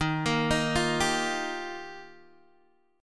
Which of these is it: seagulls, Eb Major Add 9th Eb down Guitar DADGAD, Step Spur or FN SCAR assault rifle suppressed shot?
Eb Major Add 9th Eb down Guitar DADGAD